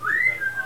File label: two tone lofi